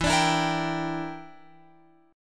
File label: E Minor 7th Flat 5th E, Guitar Open F; Em7b5; strummed